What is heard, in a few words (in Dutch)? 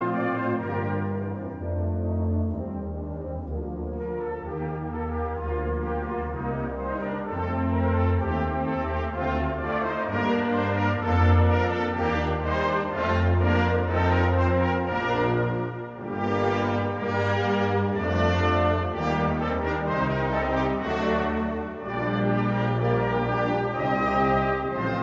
Drumfanfare - Rondo